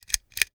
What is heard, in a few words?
Household Light Switch Pull String